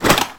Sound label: Gun Ammo Pickup, Weapons Foley; SFX Kit